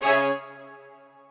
strings

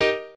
piano

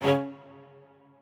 strings

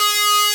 lead

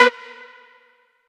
Mainlead Melody

